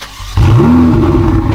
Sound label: start, vehicles, ferrari 488 gtb; autos